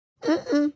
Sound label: Shakes head